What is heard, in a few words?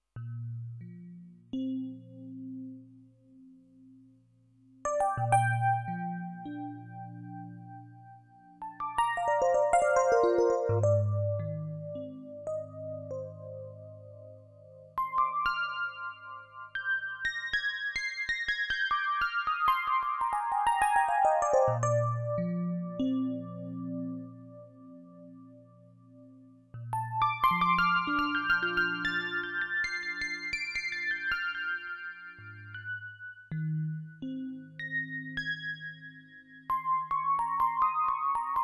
Epiano